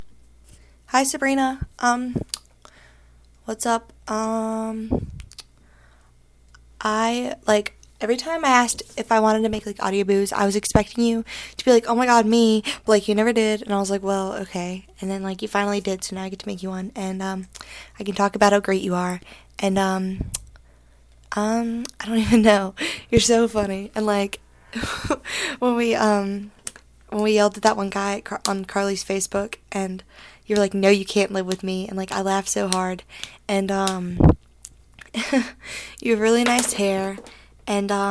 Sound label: uke solo